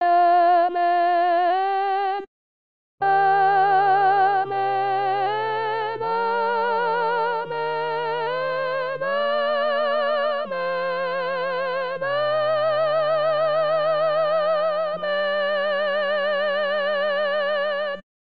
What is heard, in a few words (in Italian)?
Amen Solenne Soprani